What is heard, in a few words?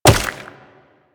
Wall Jump Ice; Footsteps; Warframe; Tenno; Wall Run